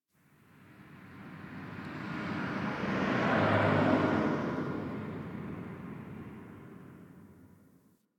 Distant Traffic F; Warframe; Ambience; NineteenNinetyNine

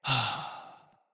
Death, Battle Barks; Envoy

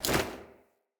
Generic Weapon Light Aim Transition Out

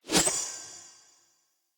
Sword Attack Warning Glint; Weapons; Warnings

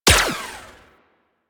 Laser Gun Fire; Shield Osprey

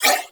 Nunchaku Prime Whoosh; Weapons; Warframe; Tenno

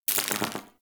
Minigun Mech; Warframe; Corpus

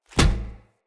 Kick Impact Metal; Envoy

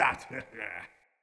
Sprout Amb Vocal; Fey Hub Mustache Sprout; Friendly; Sproutfolk

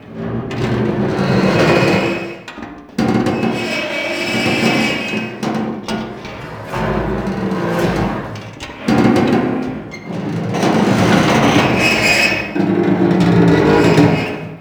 Large Metal Creaking Loop; Props; Warframe; Tau; Ambience

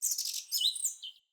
Bird Song; Josalfar Bird Guide

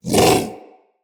Predasite Vocal Bark; Characters; Hunting Critters

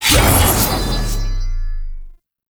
Backstab Cast; Warframe; PaxDuviricus

